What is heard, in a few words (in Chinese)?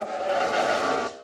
骷髅马嘶鸣声